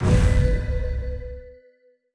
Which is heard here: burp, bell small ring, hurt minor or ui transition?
ui transition